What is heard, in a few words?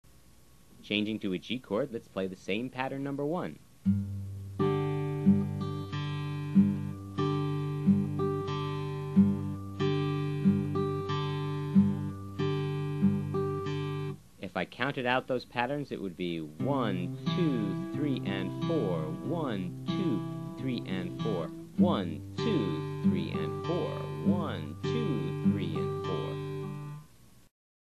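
Pattern 1 G, finger picking guitar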